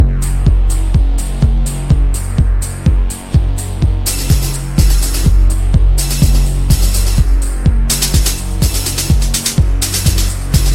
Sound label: Stadium rave, ringtone